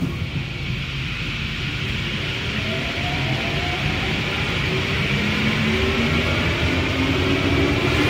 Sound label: start, metro